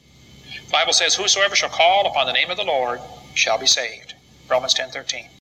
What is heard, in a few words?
Gospel Message